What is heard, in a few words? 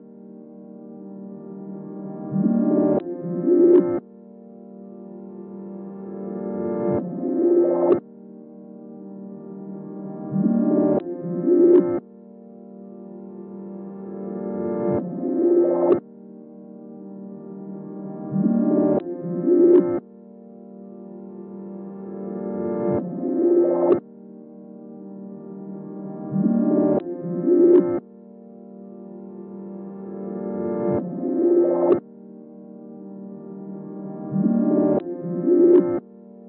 120 BPM G Min Reverse Pad; Cymatics; Blue Eyes